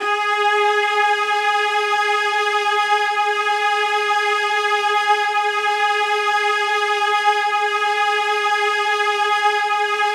Ab Basic String

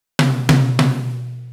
Lo Tom 100BPM Acoustic Clean

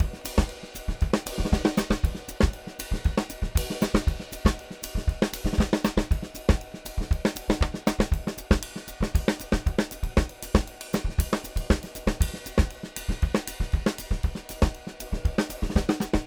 118 Live Drums Sway

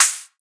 hihat open one shot sharp

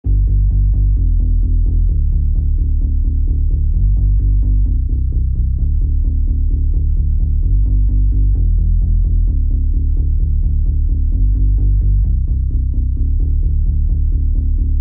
BASSLINE

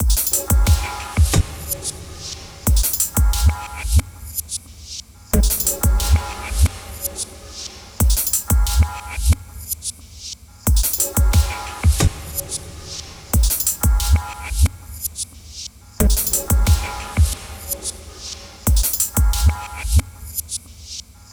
90 D m Drums

